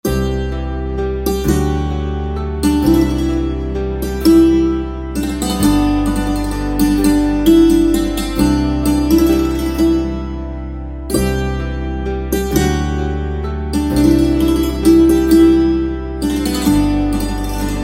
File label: Santoor, instrumental ringtones